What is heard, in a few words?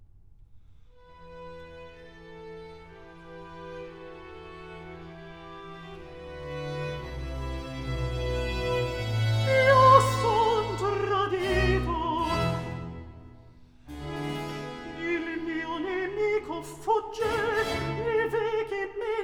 Accompagnato; Act III; Io son tradito; Handel; Ottone, re di Germania; Ottone, HWV 15